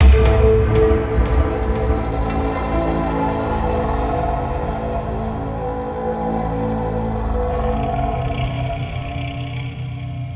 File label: Music Sting; Lepiota1